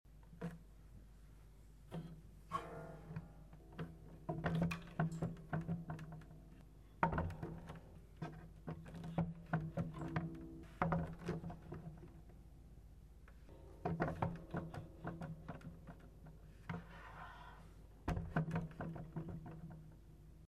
deplacement, harpe

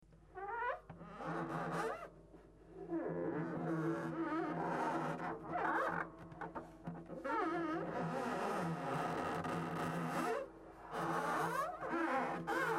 grince; harpe

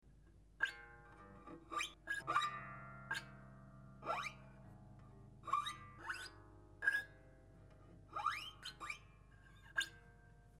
ongle, harpe

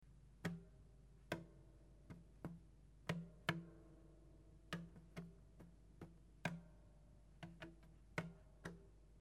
chiffon, harpe

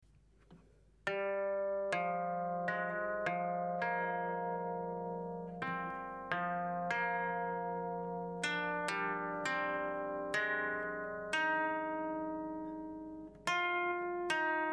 xylo, harpe

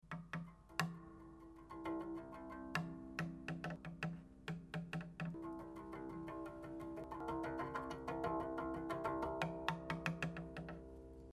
archet mix, harpe; touchers de la harpe

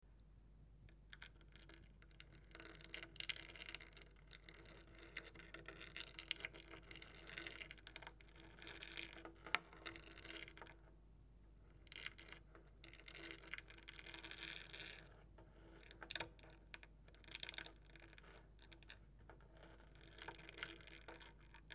peigne, harpe

